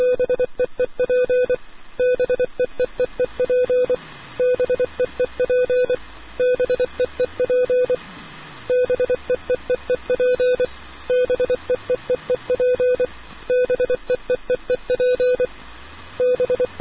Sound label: ambient; Portal 2; Source Engine; video games